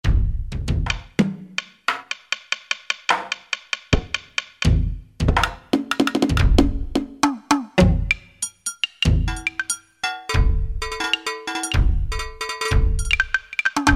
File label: Asia Perc, Alesis QS8; Factory Preset examples